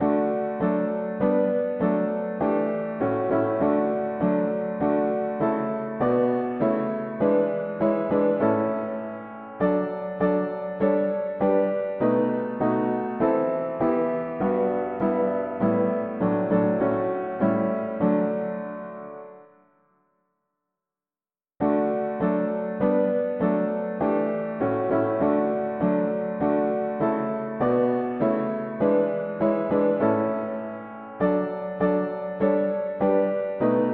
Soldat de Christ mono piano

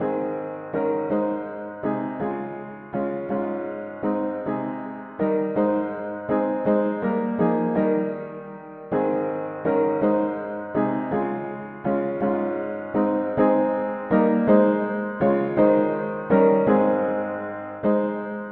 mono piano, cantiques; hymns; Jesus est la qui tappelle

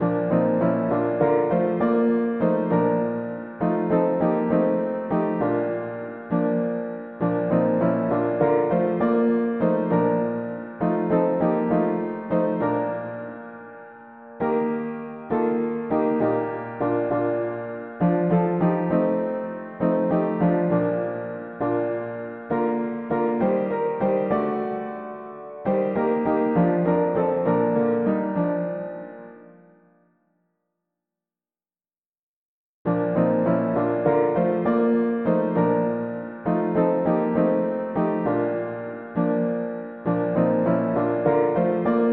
Ne crains rien quand ton ciel est noir mono piano

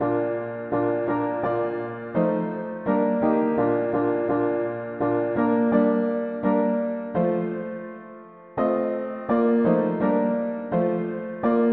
mono piano; Ton amour demeure le theme; hymns

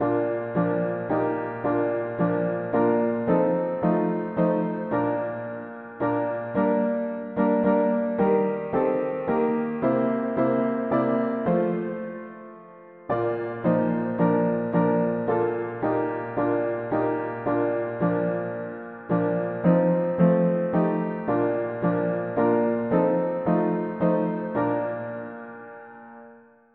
Plus que vainqueurs mono piano

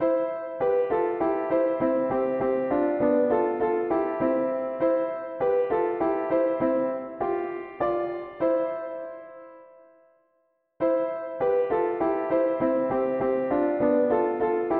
Jesus ne change pas mono piano; hymns

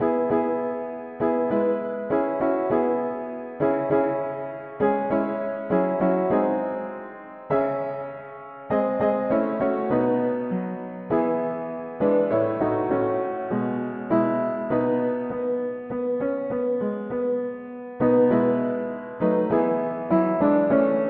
Mon ame attend le Seigneur mono piano

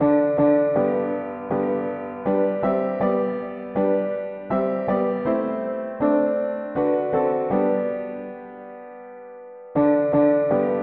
Quand le doute mono piano

